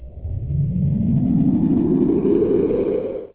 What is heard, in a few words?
space sound; MiniSpeak Special FX